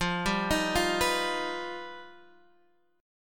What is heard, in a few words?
G Augmented 7th